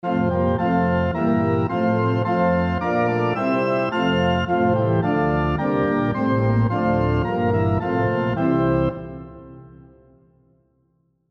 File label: SATB on Piano Example